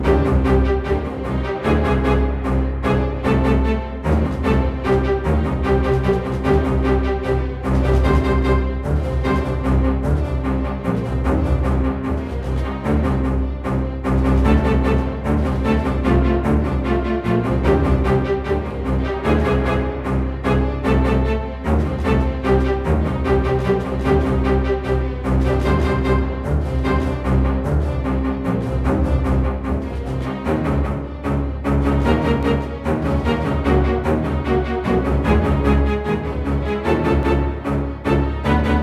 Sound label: cello rythm; yager windows xbox gamerip 2003